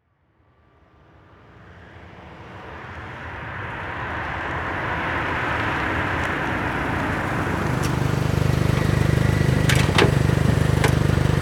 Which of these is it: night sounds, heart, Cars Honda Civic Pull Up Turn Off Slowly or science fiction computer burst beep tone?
Cars Honda Civic Pull Up Turn Off Slowly